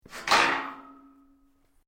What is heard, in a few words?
METAL STRAND MOVE; Metal (Sound Effects:Materials)